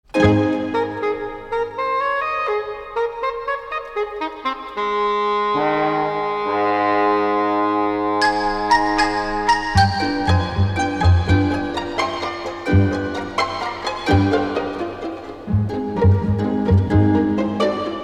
Pizzicato Pursuit, Suite For Horn, Woodwind And Strings; Short Version; KPM 1000 series